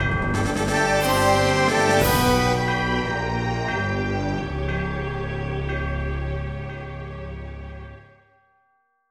Fanfare

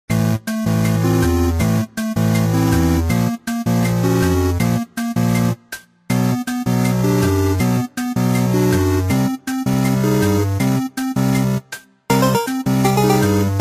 In Game Music (30 Second Timer); Kahoot; Ghost Mode

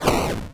Fireshot; bloo kid 2

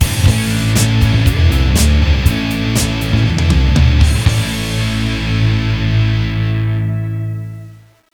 Music Baseball; big league sports summer 2009 nds gamerip

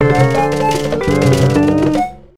Sting Dizzy; SimAnimals Africa 2009 nds gamerip

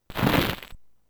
Burn; lemmings; x68000

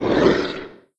attack spitter

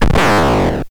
Clawcatch; tempest 4000

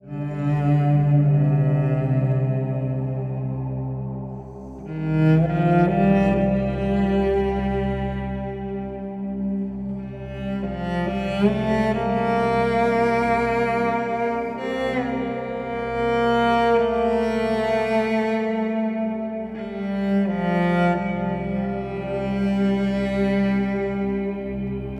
Stinger; Valley; Sprite Reveal

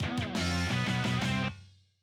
UI Window Get Open

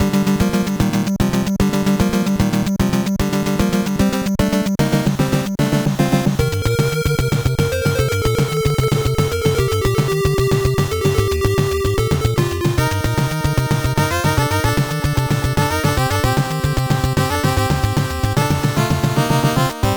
Stage 2 BGM; final mission 1990 nes gamerip

